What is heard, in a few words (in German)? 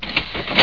hebel